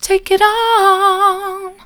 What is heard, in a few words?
take it on soft, vocal